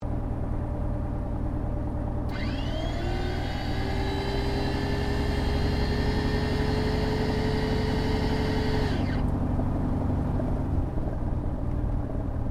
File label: AIRCRAFT CESSNA O-1 BIRDDOG TAXI INTERIOR, spotter aircraft; Airplanes